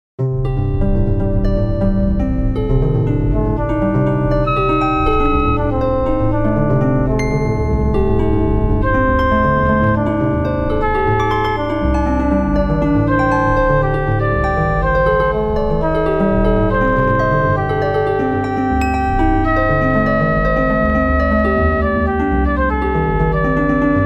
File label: changing rhythm 2 3 2 7o8 rhythm